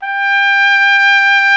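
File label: BRASS TRUMPET G 2; Roland LA; ORCHESTRA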